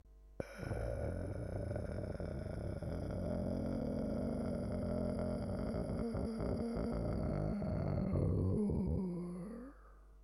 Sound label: hell, ambience; averno